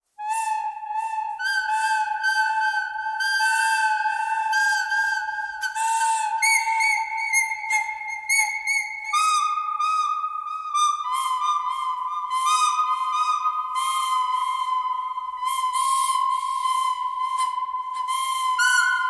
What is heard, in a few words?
bird canyon thread pentatonic